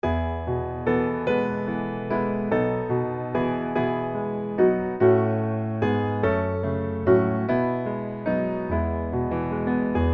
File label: piano